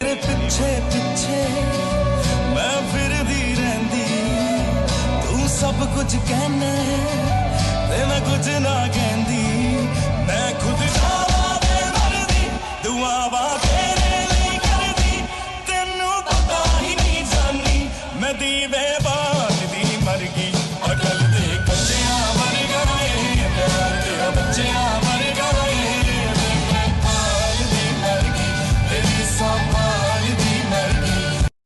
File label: punjabi ringtone